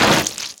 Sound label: zomb body impact; kfsounds; css